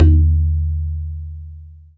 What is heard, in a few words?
TABLA GA; ILIO; Synclavier Percussion 2; World & Orchestral